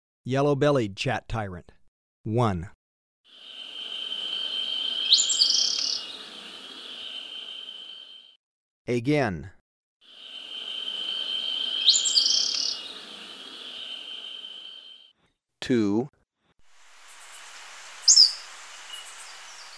Yellow-bellied Chat-Tyrant; S. America

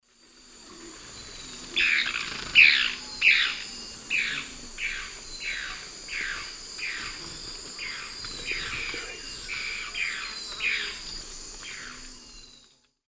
Phlegopsis erythroptera, calls; S. America; Eastern Lowlands

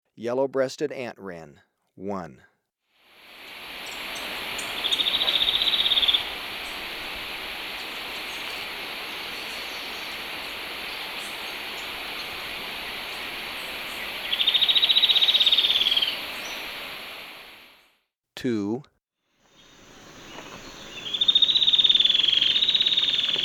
Yellow-Breasted Antwren, Birds of Ecuador; S. America

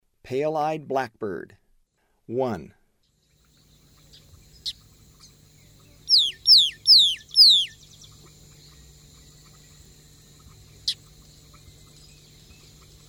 Pale-eyed Blackbird; S. America; Eastern Lowlands

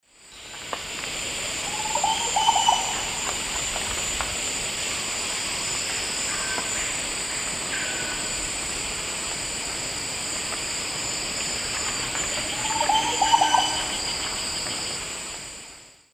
Psarocolius viridis, subsong; S. America; Eastern Lowlands

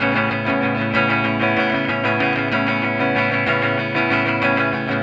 Pulse Delay Guitar; Guitars

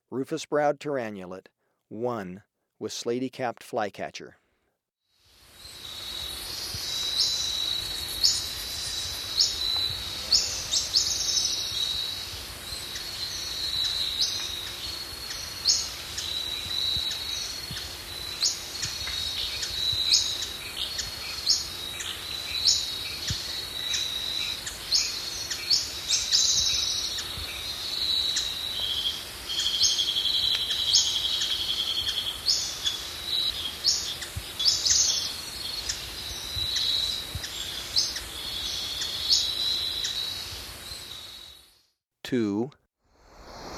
Rufous-Browed Tyrannulet; S. America

